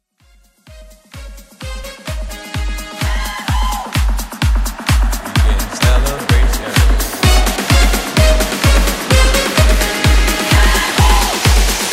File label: House Mashup; Rework Clean